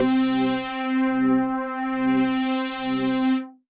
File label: Pad C, Synth Prophet One Shots; Electronisounds